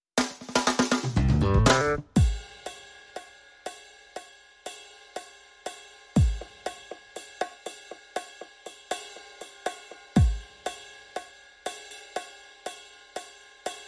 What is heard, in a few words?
Funky City, Rhythm Section